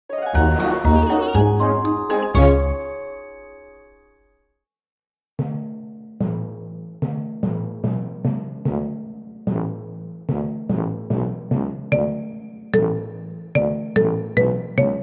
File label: Nursery Rhymes Songs